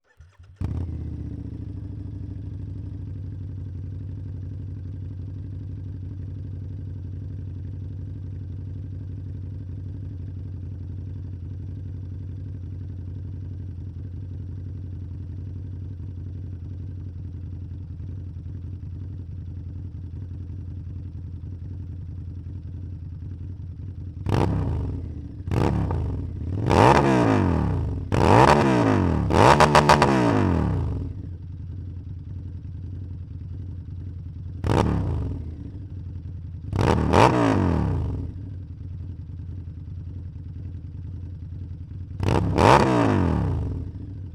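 Harley Davidson V Rod Onboard Start Idle Blips Steady in Neutral Off Exhaust; V-Rod Night Rod 2014; DPA4062; Pole Position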